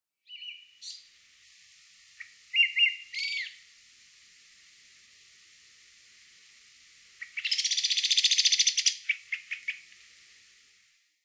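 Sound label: akahara, Brown-headed Thrush; yaitashi; koe; hirano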